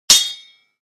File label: Two swords clash together single strike